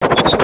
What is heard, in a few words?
fly; BANZAI